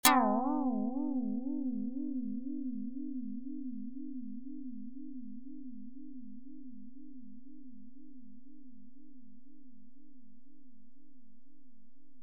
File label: karplus Strong Chorus; FSound